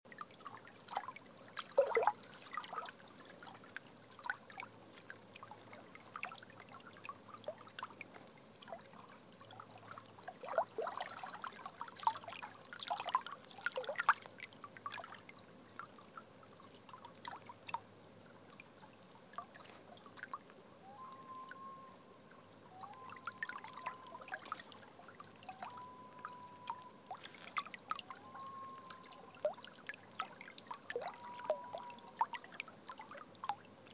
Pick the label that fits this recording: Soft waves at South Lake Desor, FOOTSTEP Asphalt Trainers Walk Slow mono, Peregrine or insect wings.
Soft waves at South Lake Desor